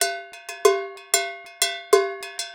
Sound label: Dance Low Bell; Dance DJ; Percussion; BellCowbellAgogo; Pro Tools Essential Loops; Digidesign